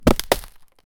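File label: beaver chomping into a log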